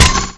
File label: fall; Alien Arena; droideka